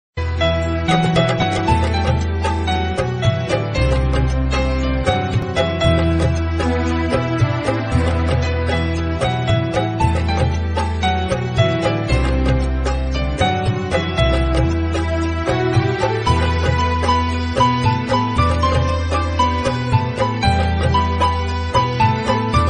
best old instrumental ringtone old is gold

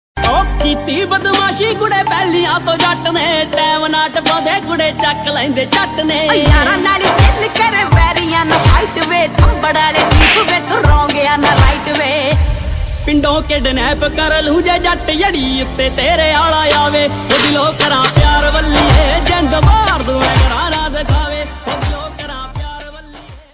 new punjabi song ringtone

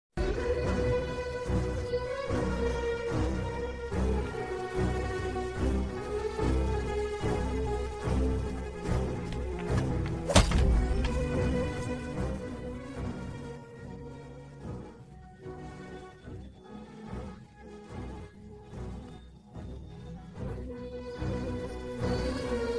emotional bgm ringtone; naa saami ranga movie

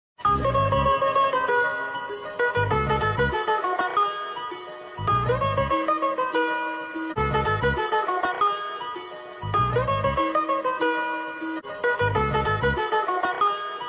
love bgm background music ringtone; alludu adhurs

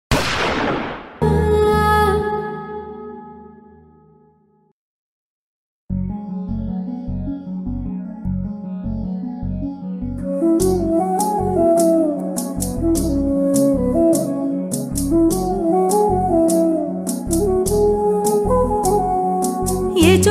Female Version Song, Telugu; Telugu Private Songs

